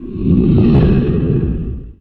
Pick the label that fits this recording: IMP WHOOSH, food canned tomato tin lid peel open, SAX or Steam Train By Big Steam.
IMP WHOOSH